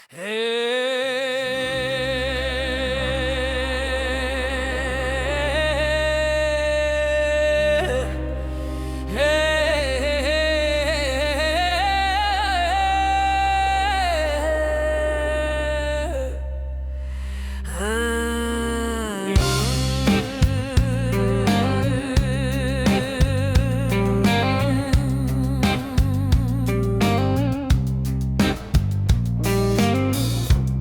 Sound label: ukrainian beat